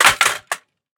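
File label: rifle drop concrete; STALKER; IWP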